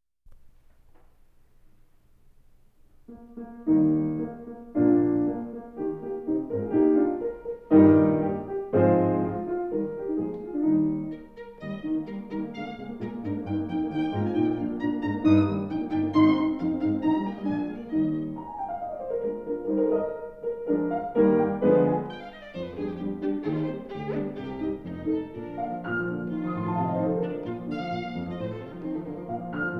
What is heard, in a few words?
Violin Sonata op. 12 no. 3 movement 3; Beethoven